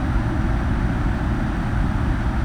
train interior idle; prague; Soldier Of Fortune II Double Helix; XBOX; ambience

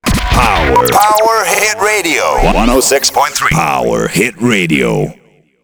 Power Hit Jingle; Project Gotham Racing 2; radio; Power Hit Stockh

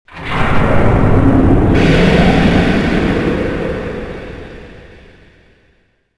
piston start; movers platforms

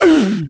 man hit, duel; Pirates Of The Caribbean

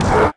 menu back; Tony Hawk's Project 8; XBOX

